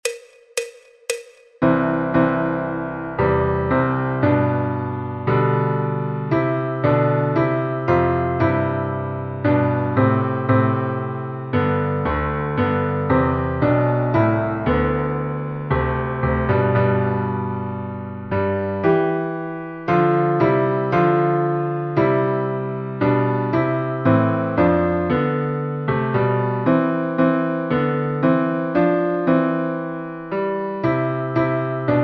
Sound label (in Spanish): Adeste fideles Piano Fácil en Do Mayor C